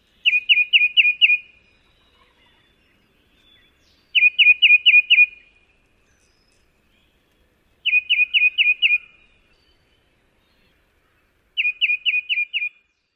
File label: songs